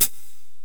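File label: Closed Hi-Hat, Dance Drums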